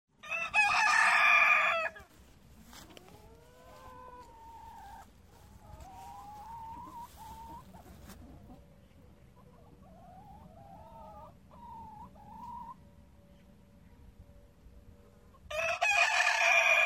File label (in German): Huehnerstall mit Hahn